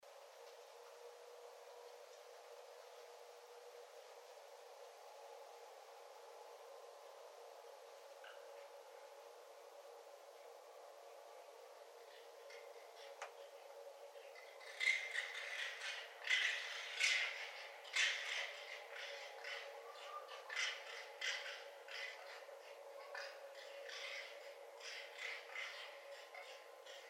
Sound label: Raccoon; Northern Raccoon (Procyon lotor); North America